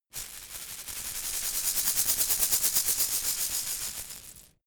insect shaker rattle dynamic

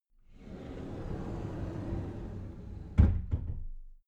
cupboard slide door close soft

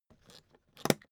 suitcase pull down latch lock hard

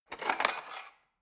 cutlery fork drawer handle scrape

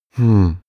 male sigh pondering

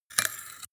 sword scabbard handle pull soft short

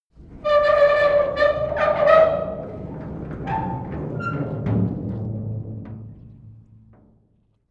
metal gate close long

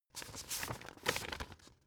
old examine

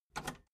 metal lever button radio enable fast

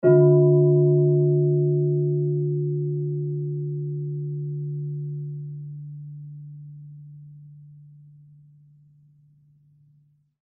usa cristal bowl set, D

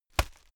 hit plastic fleshy hard

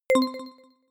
notification short bell

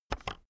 keyboard external enter press fast

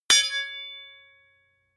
sword impact metal ring heavy

